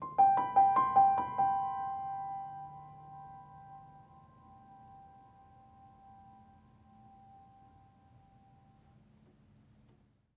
Music Level Argument Stinger; Florence

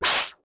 SFX aggro; GONNER

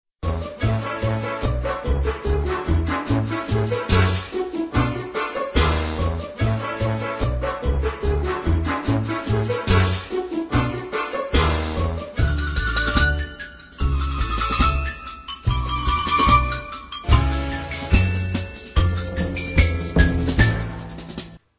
chest game; the knight the dragon

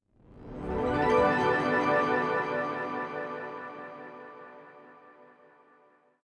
Music Forest Intro; Sayonara Wild Hearts

